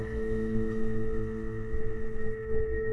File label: water splash